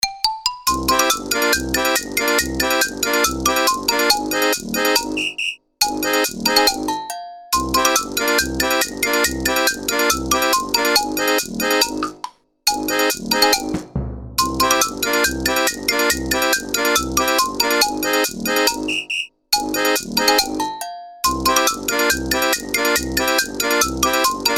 pet simulator style music of a pet catcher running away